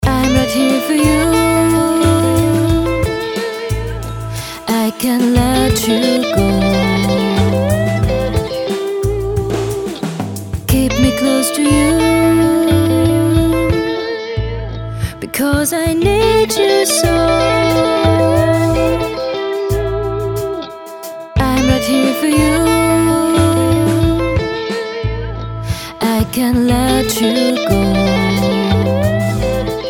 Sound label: Chillout